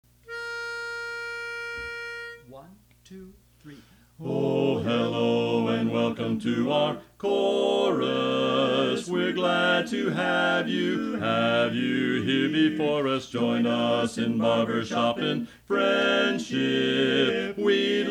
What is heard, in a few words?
Welcome Bass; learning tracks